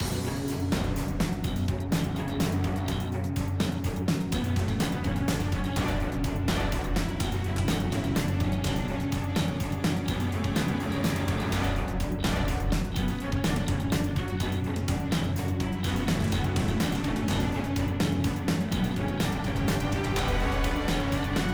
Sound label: Stadium Action